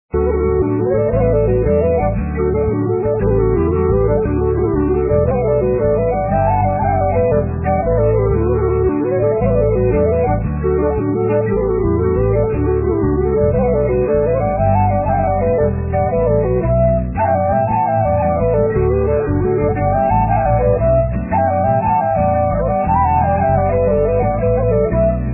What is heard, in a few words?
lofi